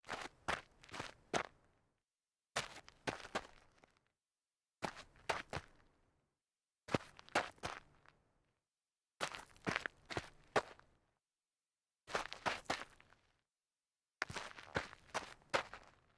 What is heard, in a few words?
sneaker sidesteps light grit